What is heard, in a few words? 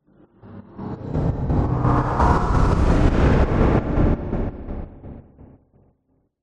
sound design sweep chopper stutter slow dark chorus